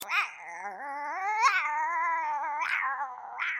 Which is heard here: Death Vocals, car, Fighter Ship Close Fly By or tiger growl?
tiger growl